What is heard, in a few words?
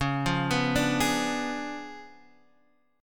C sharp 7th Flat 9th, chord; C#7b9; arpeggiated; JGuitar